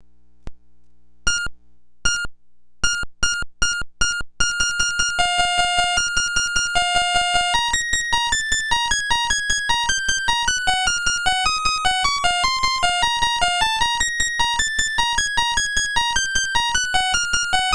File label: PIANO